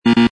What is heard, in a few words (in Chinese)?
错误; 音效